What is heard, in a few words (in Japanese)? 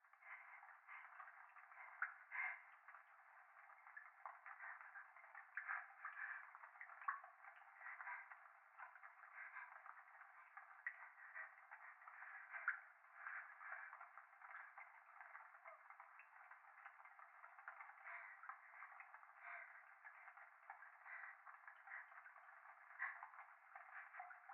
風呂 左耳快感吐息 雨上がり